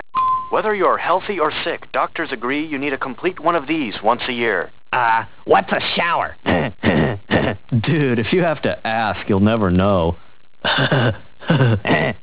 shower

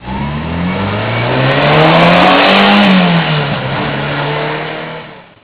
ferrari 308 drive by

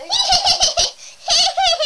laugh

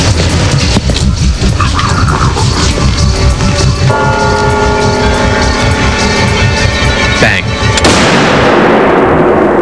bang

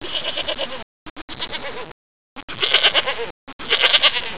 goat

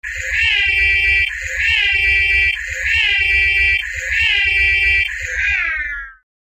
ecto 1 siren

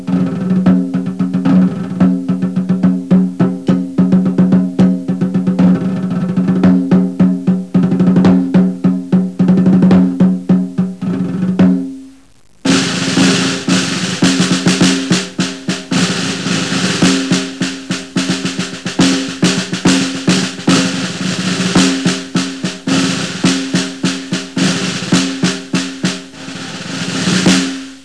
drum

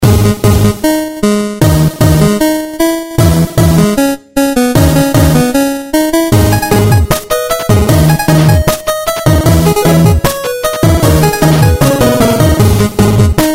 8bit

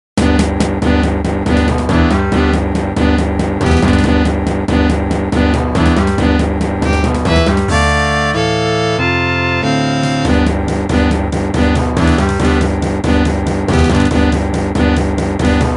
Orchestral Things

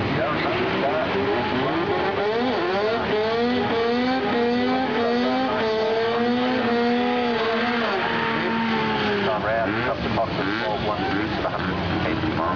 cd burn

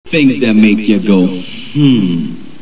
hmmmm

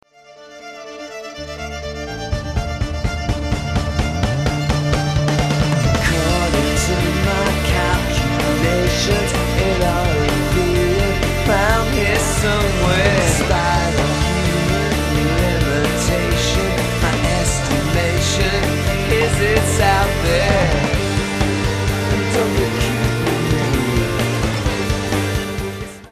gypsy stomp

